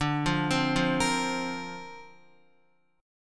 D-Minor 7th Sharp 5th D Guitar, chord; Dm7#5; arpeggiated

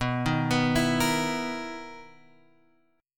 B Major 11th B, guitar; BM11 Chord {7 6 9 9 X 6} arpeggiated

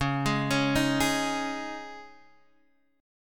Db Suspended 2nd, Guitar, Open D

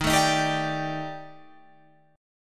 D sharp Major 7th Flat 5th, down, chord

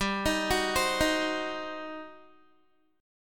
G Major 7th Suspended 4th Sharp 5th, Guitar; GM7sus4#5 arpeggiated; 15,18,16,17,15,x

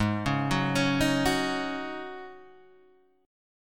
G sharp 7th Suspended 4th G sharp, Guitar DADGAD

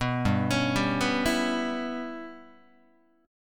G 6th Flat 5th G, Guitar; G6b5 arpeggiated; JGuitar

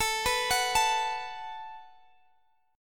A 6th Suspended 2nd, guitar; A62 arpeggiated

